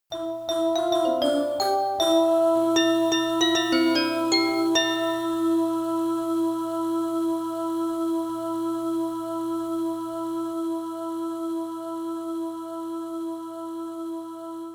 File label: music; KSWT